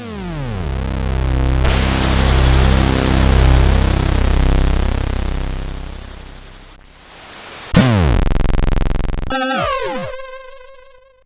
Happy 50th Birthday Rock sid CSG8580R5

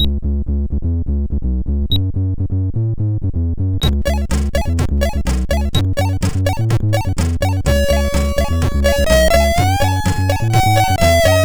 MOS6581R4 sid

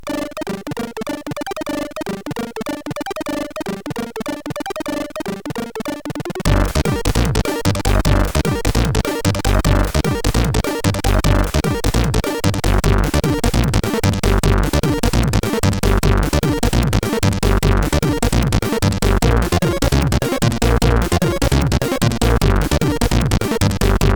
Folk-Tech, CSG8580R5; hvsc; Froyd

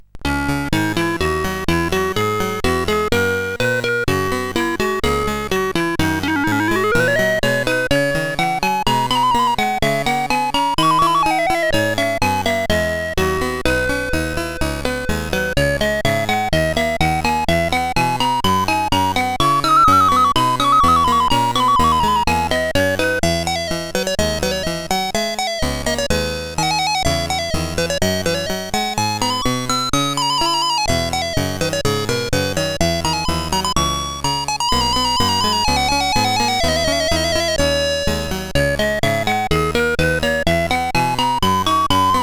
D Minor, CSG8580R5; Sonett

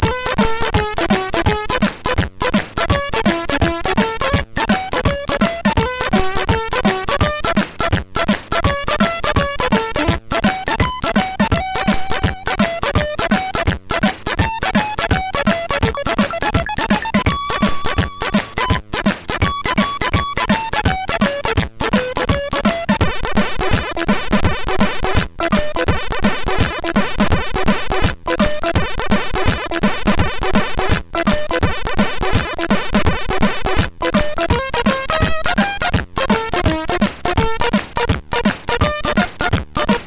Synthetic Jazz CSG8580R5; hvsc

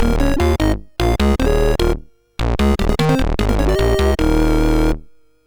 Game Music, sid MOS6581R3

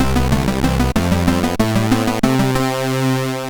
Race, sid, MOS6581R3

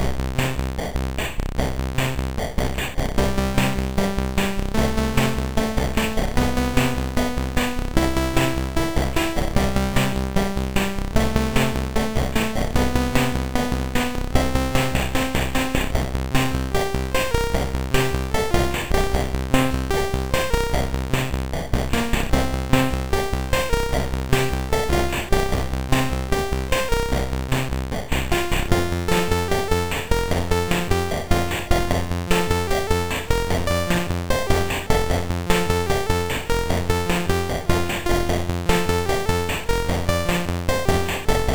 Amiga Emulator, MOS6581R4; Scheepers Marco

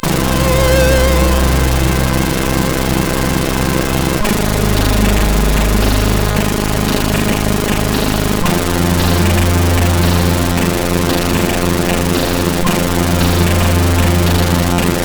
E-Load loader, CSG8580R5; hvsc

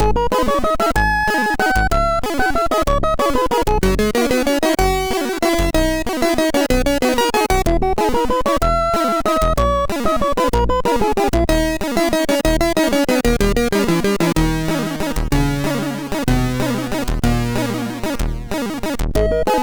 MOS6581R2, sid

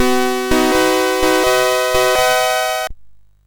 MOS6581R3, GAMES; Waterline

